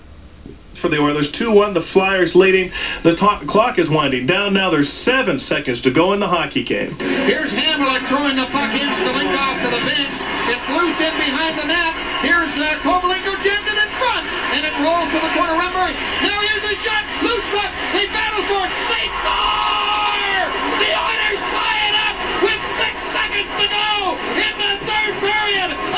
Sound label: goal